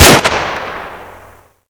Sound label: aug, weapons; l85a2; gmod